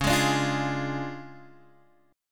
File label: D Minor Major 7th Flat 5th C sharp, guitar